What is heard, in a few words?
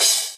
Cym short